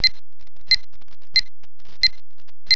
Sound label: wittner mt40, metronome